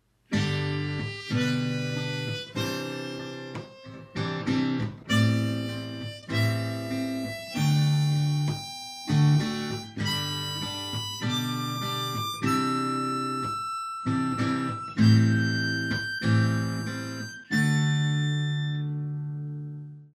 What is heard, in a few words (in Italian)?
CHITARRA E ARMONICA ACCORDI